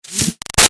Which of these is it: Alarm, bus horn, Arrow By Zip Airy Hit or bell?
Arrow By Zip Airy Hit